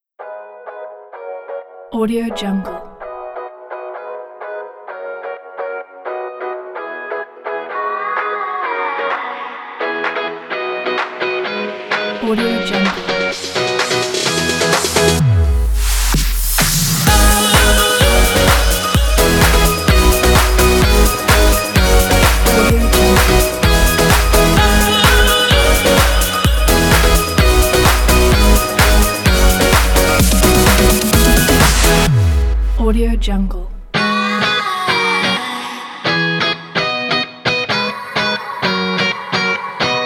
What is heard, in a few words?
positive pop pack